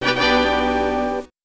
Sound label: Startup; Windows 3.0 Startup; Windows Never Released Soundpack 1